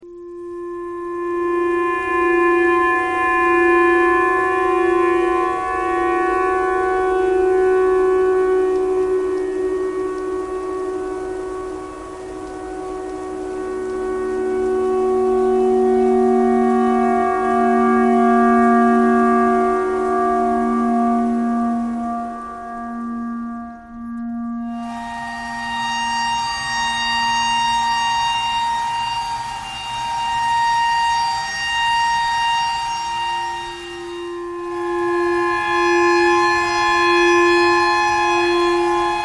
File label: tornado siren clarinet thread pentatonic